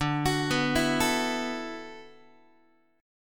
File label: D 6th Add 9th D, Guitar; D6add9 arpeggiated; x,10,9,9,8,8; JGuitar